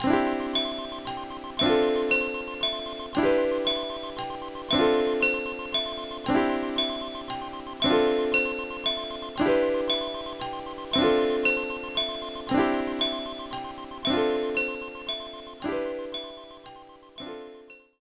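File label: background music; No No No Puzzle Chailien; GBA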